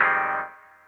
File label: organ